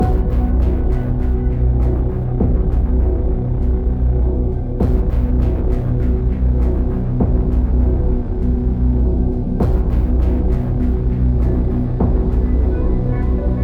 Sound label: Darkest Loop